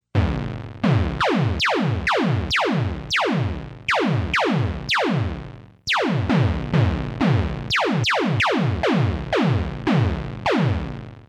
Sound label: drumpad dynamic; analog synth; sound lab mini synth; drum trigger